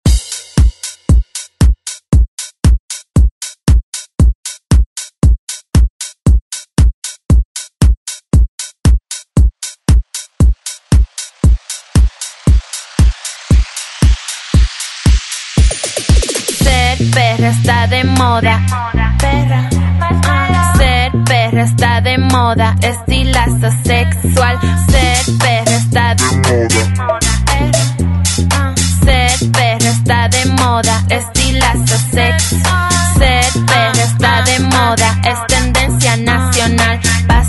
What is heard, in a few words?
re drum